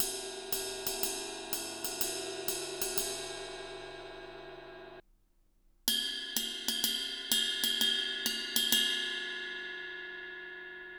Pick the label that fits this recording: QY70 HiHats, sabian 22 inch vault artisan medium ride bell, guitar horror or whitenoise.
sabian 22 inch vault artisan medium ride bell